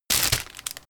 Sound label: plastic package grab